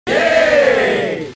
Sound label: yeeeeey